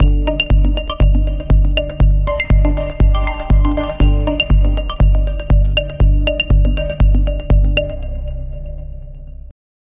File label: a melodic techno pluck, techno